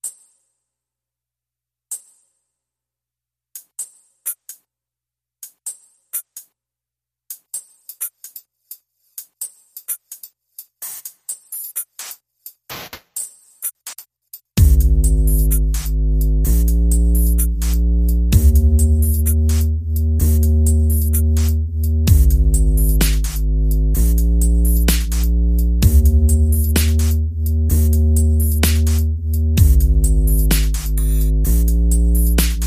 Drums and Bass Mix

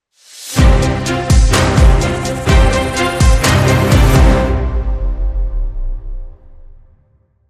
Hybrid Sting

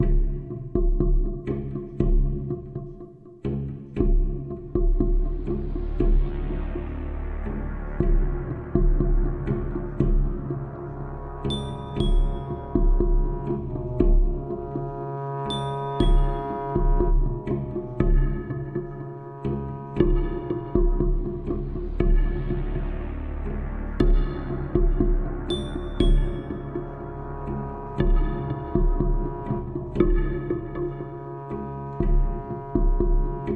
Heartbeat

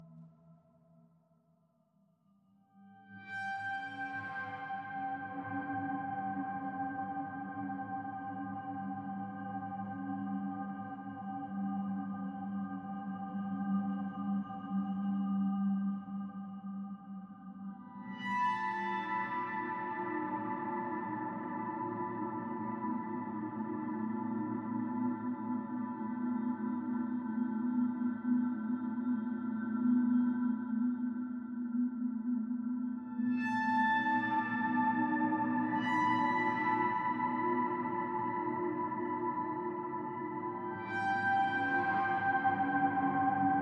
Strings Only

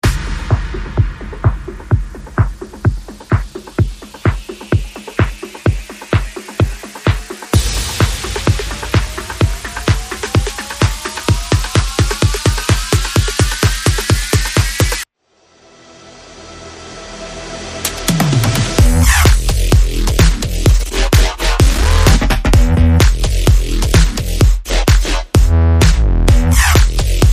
Percussion Bass and Effects

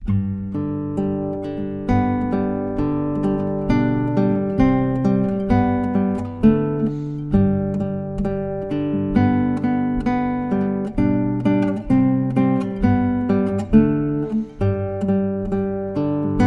Guitar

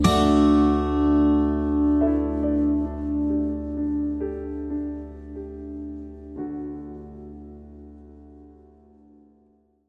Loss Sting

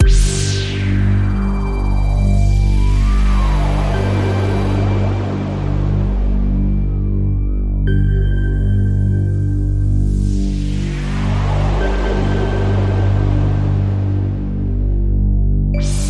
Radio Toolkit Pad

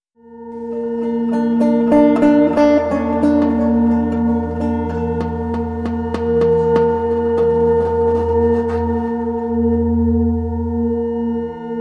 Suspense

